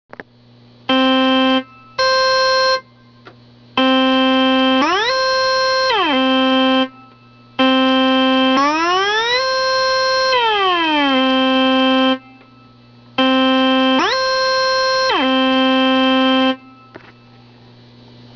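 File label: portamento, organ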